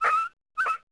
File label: K9 killed; Holiday Software Sampler (Sierra On-Line) (1998); SWAT2DEMO